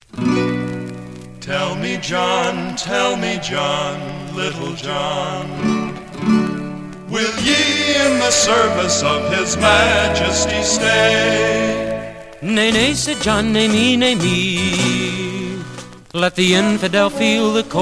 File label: Lute Flute Bass and Harmonium